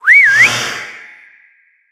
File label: chariot whistle; smite; Apollo